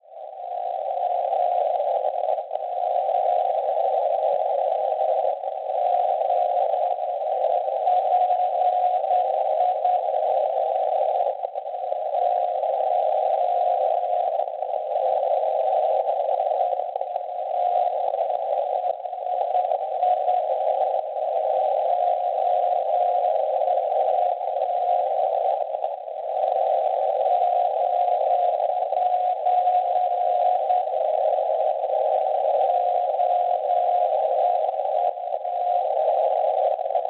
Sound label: NDB, 363 kHz; PI; Poitiers; Francia; Schwarzsee